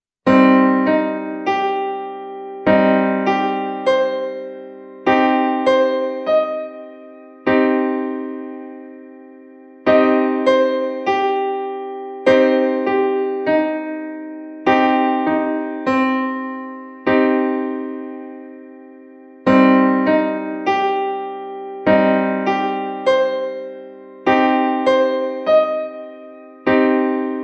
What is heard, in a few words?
Cm Piano Keyboard Chord